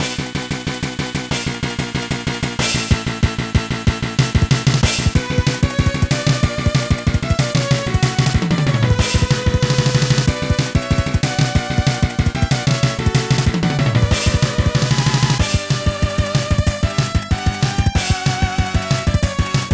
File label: Red Alert; Mega Man X2